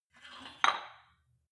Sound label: weight rack insert weight medium, fitnessstudio